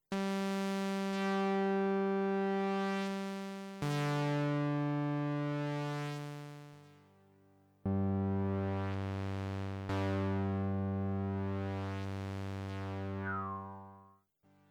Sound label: G drone